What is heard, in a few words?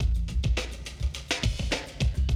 Classic DrumLoops